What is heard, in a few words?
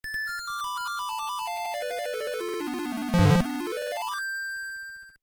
Silver Harp; Dragon Warrior; NES